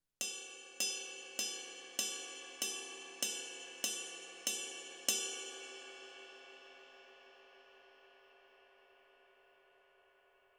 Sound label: 22 RIDE BELL, cymbals